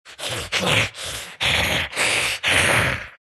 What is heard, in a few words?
snarl; Undying